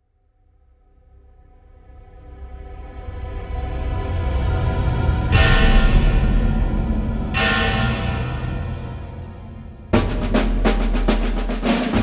theme action war

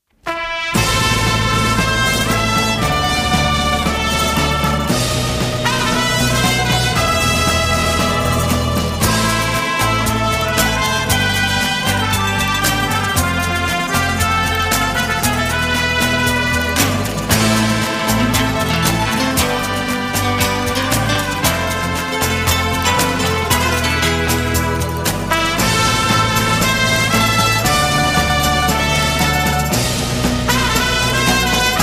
Western Vibe, medium

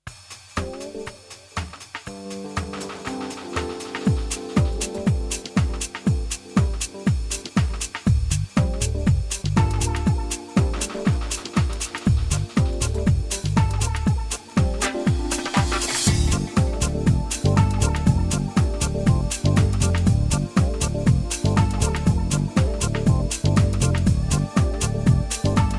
Mood Jungle

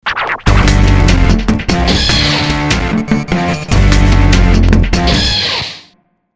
indu power short

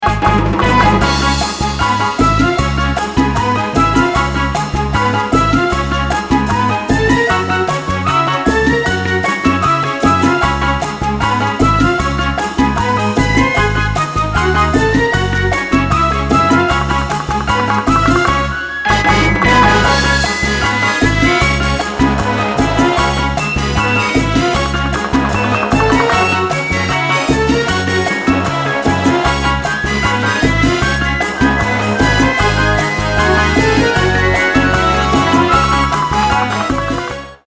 Latino, happy

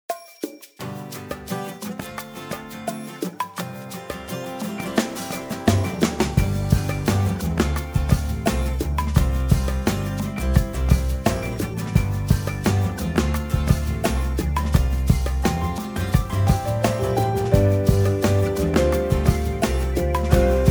piano

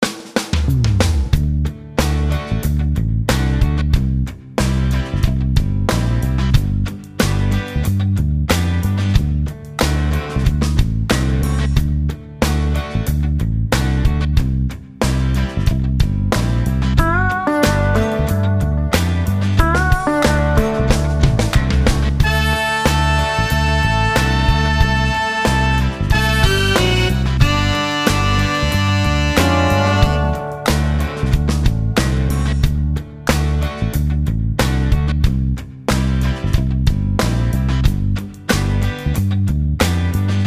rock mainstream nice, slow

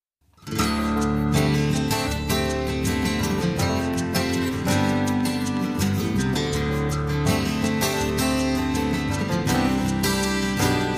Ethnic House, slow

